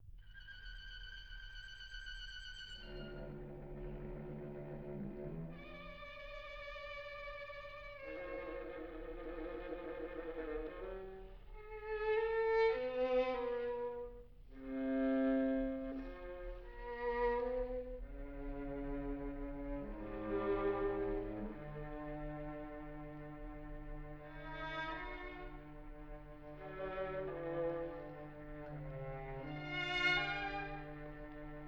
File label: Quartet B Flat Major, String Quartets; Béla Bartók